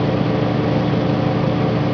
fan loop

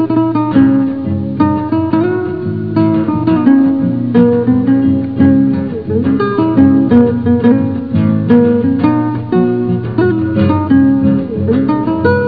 court music

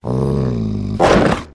dog

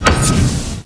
push; chaapaai

